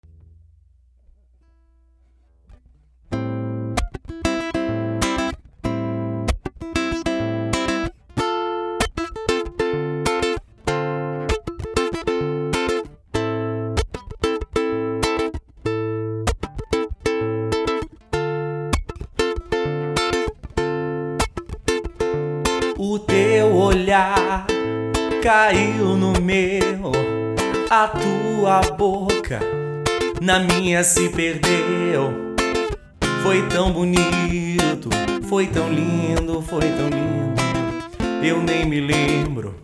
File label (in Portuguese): violao voz